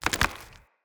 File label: Mockery Foley Melee Swing; Enemy; Mockeries; Characters